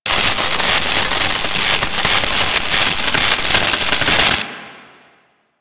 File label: Electrocuted; Warframe